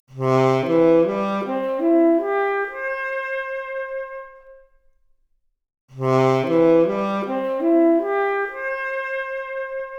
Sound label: Saxophone arp, Stereo output noised; 3bit